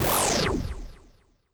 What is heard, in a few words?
weaken